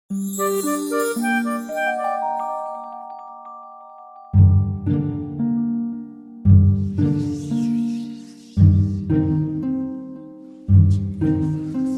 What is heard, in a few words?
JINGLE; BIBLIO EVENT